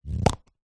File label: household shaving foam can plastic lid pop off